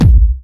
Soft, kicks

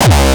kick; shock. Ultimate Kicks vol. 2

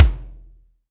SR16 Kik Garage, Bass Drum - Kicks

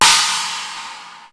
China, Roland TR-626; Drum Samples

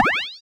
Laser

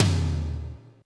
Yamaha RM 50 Toms

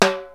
Timbale, Boss DR-550, Percussion; Drum Samples

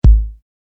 kick

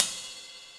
CYMBAL, Yamaha RM 50; Drum Samples; Cymbals

